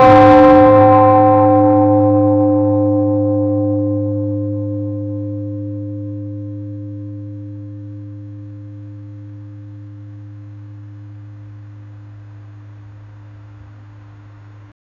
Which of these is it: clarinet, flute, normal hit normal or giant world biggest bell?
giant world biggest bell